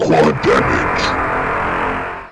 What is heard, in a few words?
quad; Conception; quake3